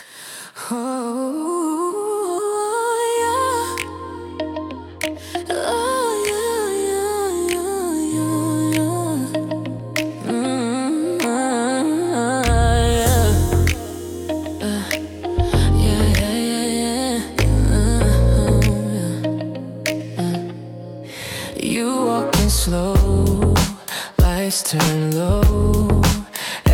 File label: Heartbeat Criminal